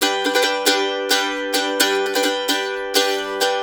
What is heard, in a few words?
132 G, Blues Guitar RIFF